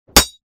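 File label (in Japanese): 槍 金属音, 武器効果音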